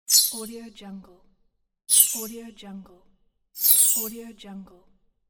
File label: short bonus